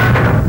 jump; quake2; bruticus

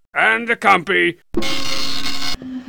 death; quake2; strongbad

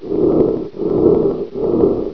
fan; quake2; ben

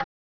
tick; quake2; inland

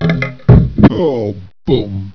death; quake2; barny

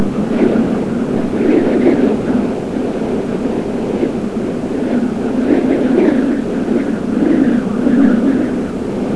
heavy wind; quake2

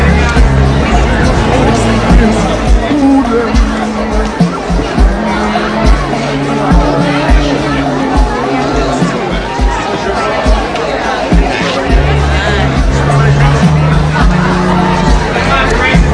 beat; quake2

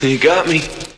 death; quake2; mcclane

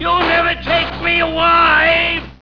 pain; quake2; fuddbeta

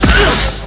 fall; quake2; mcclane

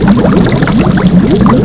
boiling; quake2

